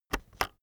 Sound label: keyboard external enter press slow